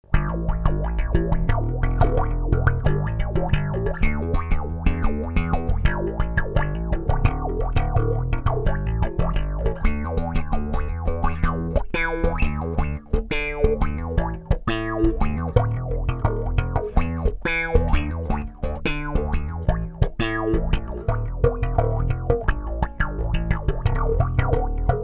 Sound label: classic wah; EBS